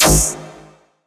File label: Bass Shot Metallic G#; Jino Bass Shot Metallic4 G#